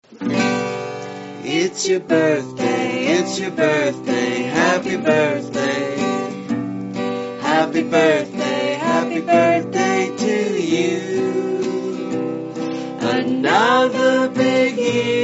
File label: Happy Birthday Song